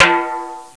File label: timbale